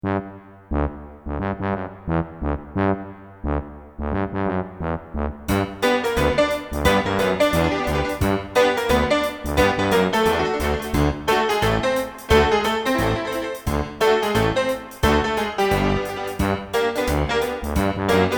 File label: ragtime